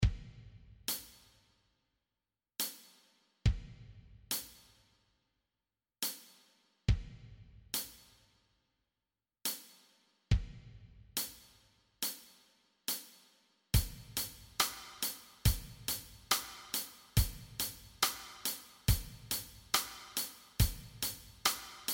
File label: Drums